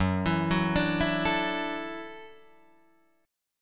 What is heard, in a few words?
Gb Minor 7th Sharp 5th, Guitar Drop D